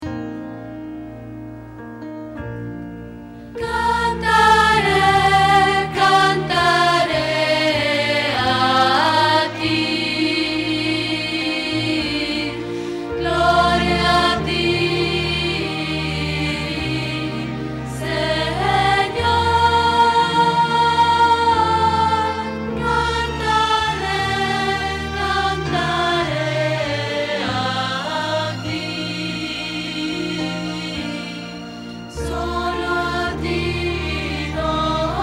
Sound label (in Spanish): Contralto